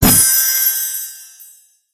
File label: Bonus Box